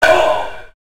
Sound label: beep